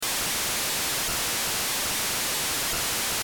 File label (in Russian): neprerivnij retro shum